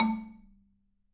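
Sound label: A2, Marimba dead note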